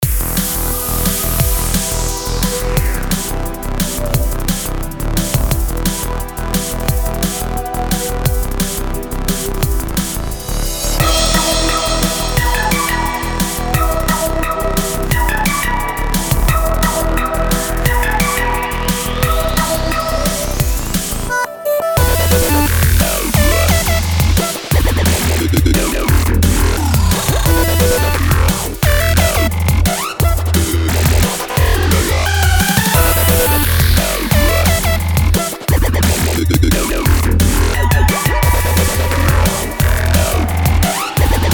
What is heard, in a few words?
Rapid rhythm Drumstep